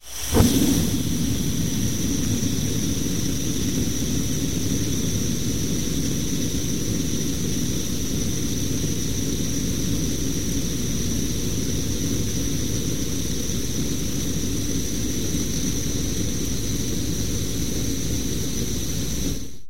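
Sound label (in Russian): звуки кальяна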